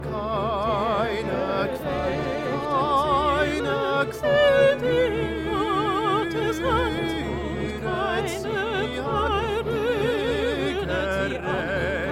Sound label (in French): Tutti; F–mesures 163 à 175-tenors; Requiem